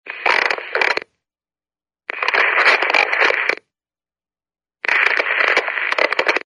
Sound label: walkie talkie noise long